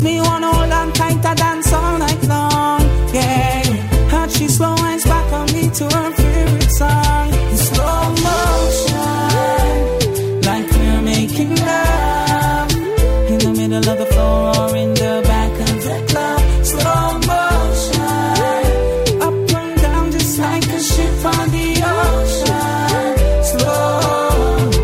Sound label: slow motion